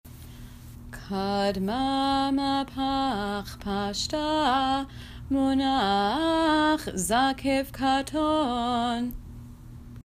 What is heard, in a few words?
Kadma Mapach Pashta Munach Zakef Katon